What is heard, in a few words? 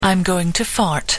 going to fart